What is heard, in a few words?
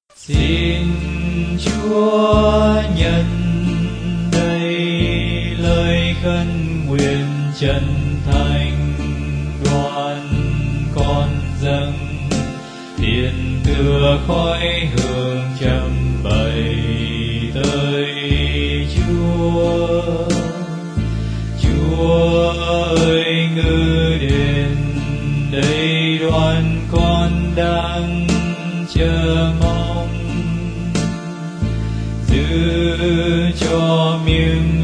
alto